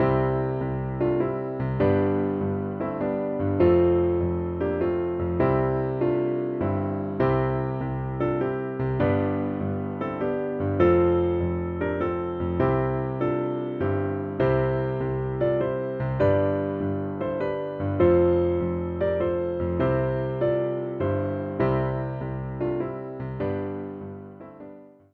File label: ii-chord Bump Exercise